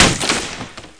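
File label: FENCE HIT; PC Gamer 4.2; mtm2demo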